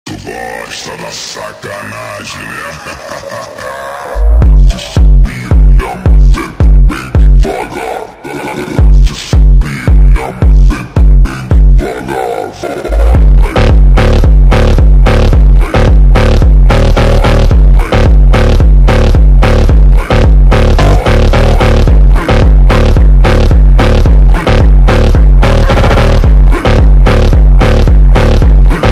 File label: phonk